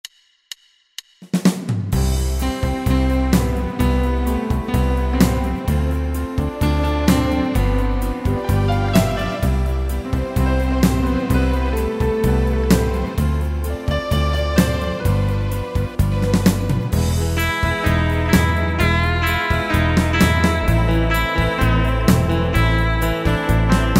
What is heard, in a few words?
Bubblegum Pop; Pop & Rock